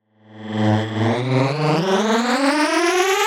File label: Fart Riser; reloaded samples